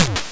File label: Snare, YM, CHIPSHOP HITS DRUMS; ChipShop Deluxe